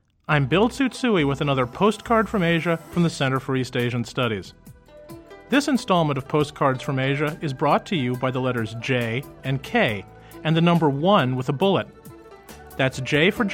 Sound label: pop music battle